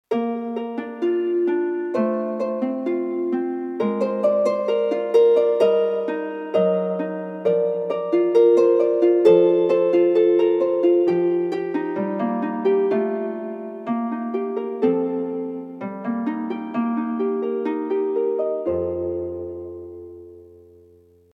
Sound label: strings harp